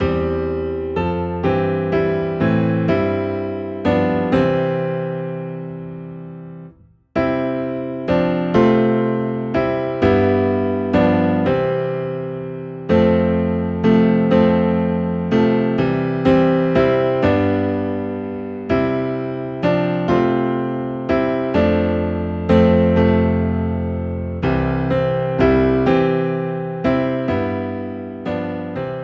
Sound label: Piano Low in C, hymns